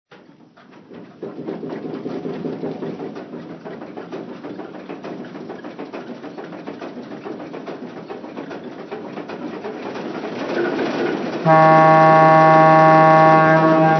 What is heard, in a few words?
Alco S-2; LIRR